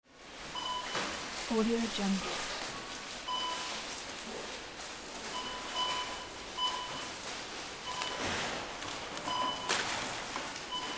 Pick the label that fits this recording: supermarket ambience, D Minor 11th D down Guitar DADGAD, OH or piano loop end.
supermarket ambience